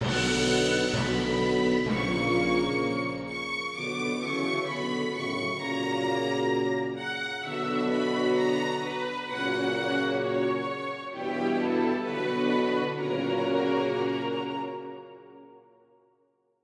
Victorious Evil; Mount & Blade: Warband; Android

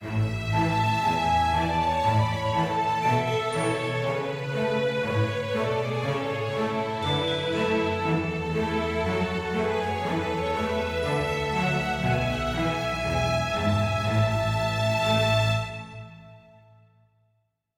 happy forte; Quivr; PS4 Windows gamerip 2016

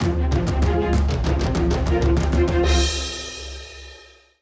Jingle Level Intro; Mario vs. Donkey Kong; Expert Levels

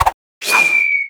Character Attack

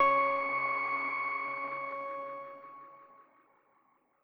chime; chime sharp

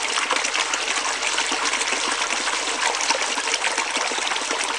Liquid Slow; Puddle

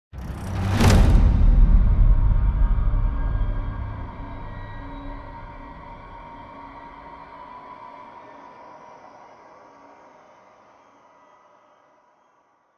Transition To Combat; project zomboid

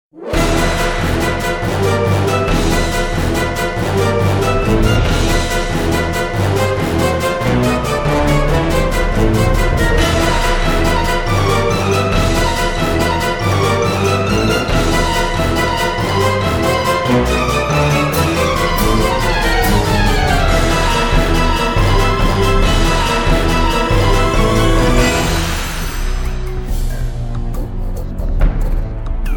Combat Loop; darkstar one; windows xbox 360

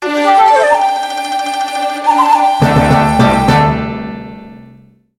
Power Up; Shiren the Wanderer: The Tower of Fortune and the Dice of Fate; PS Vita

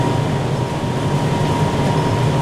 Ventil Loop

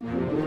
Comical Start 64bpm; Music Dialogue

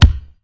Football paddle ball hit

